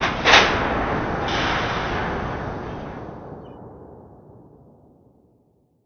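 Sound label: industrial ambience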